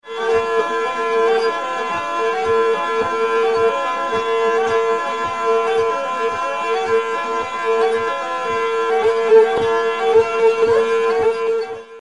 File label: fiddle gurdy